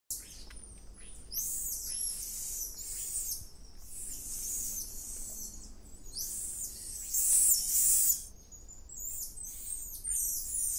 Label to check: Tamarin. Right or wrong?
Right